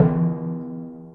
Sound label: Timpani; Orchestral; Percussion One Shots; Sonic Foundry (Sony Creative Software); Orchestral Series VOL-4 - Rock and Pop